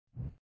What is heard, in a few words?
walk